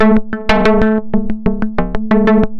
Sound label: FM Perc Loop, Analog Glitch